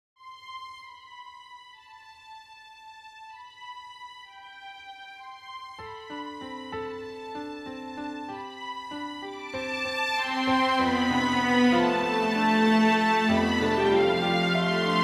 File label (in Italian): Archi e Piano